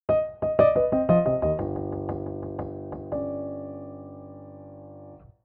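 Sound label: hammer, piano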